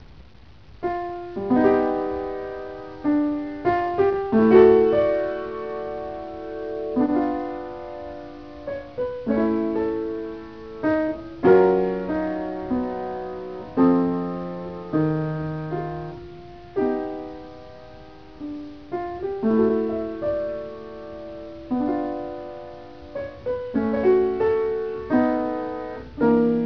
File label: Piano compressed